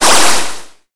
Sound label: waterball; equivalent-exchange-3